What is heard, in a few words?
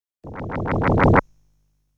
appearance sound; legend of hero tonma; Leon (enemy)